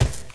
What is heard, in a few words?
Rhinoce walk; Seer; GamigoNA